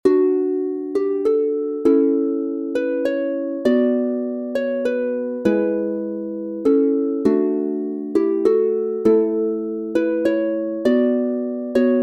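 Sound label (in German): D Dur 3 stimmig, Harfe; El noi de la mare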